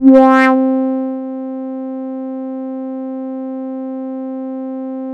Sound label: synth